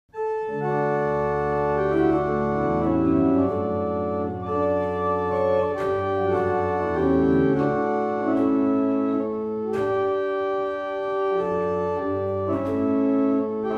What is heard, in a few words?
Double chant, barrel organ; Brightwell